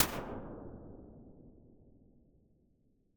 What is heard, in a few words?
LARGE DAMPING CAVE, Impulse Response